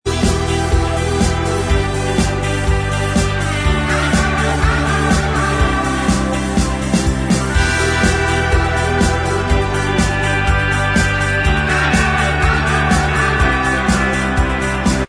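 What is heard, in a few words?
instrumental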